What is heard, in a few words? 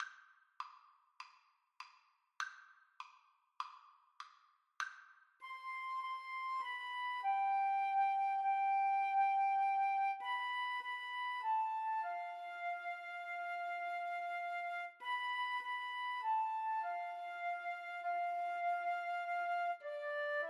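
o sole mio, flutes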